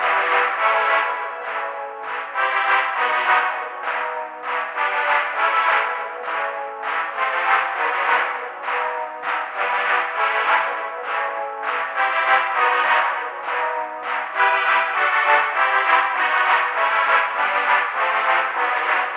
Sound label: find opponent search music loop